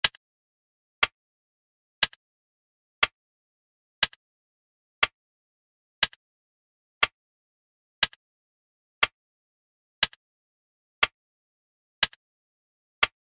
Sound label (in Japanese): 秒針の音